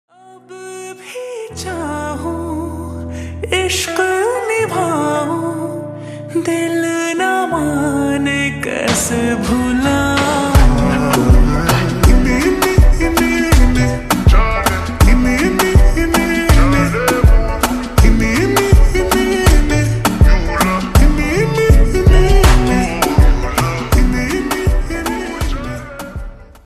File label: Slowed Reverb Ringtone